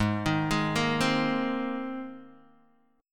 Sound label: Ab Major Add 9th Ab down Guitar DADGAD; Abadd9 arpeggiated; JGuitar